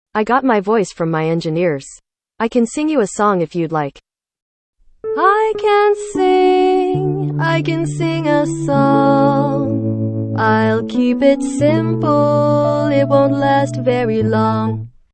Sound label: wavenet song